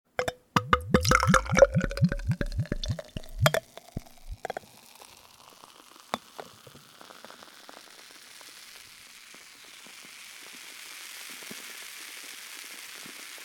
Pouring beer into glass

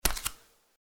Footsteps Barefoot One

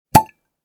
Cork sound

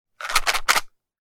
Shake the matchbox

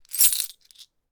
coin sound; game inn

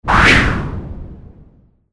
arrow; モノラル; 武器効果音

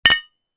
Bottle beer cheers; lifestyle

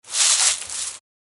dry leaves footstep; 足音

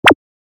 cursor

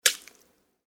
Footsteps Puddle One

